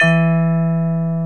VIBES BELLS

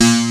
P5 BASS A#2, Prophet Five; E-MU Formula 4000 Series Vol. 3; Analog Odyssey

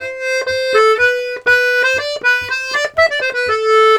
Accordions, 120 laride

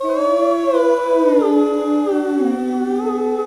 mixed oohs, Vox FX; E-MU Formula 4000 Series Vol. 1 - Hip Hop Nation

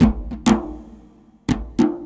Djembe; Sonic Foundry (Sony Creative Software); Ambient Atmospheres & Rhythms

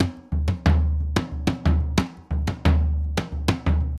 Surdo 120; Best Service; Festa Latina VOL-1; Drums & Percussion

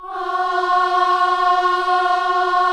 KIDS CHOIR, Gospel

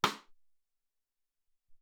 Rim 2, Alesis SR-18

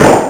SEQUENTL-TOM; Rubber Chicken Software Sample Bank; DRUMS + PERC

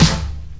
SD, Dance Drums

